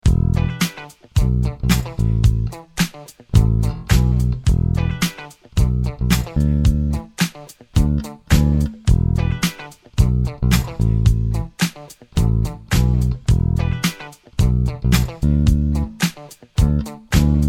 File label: bass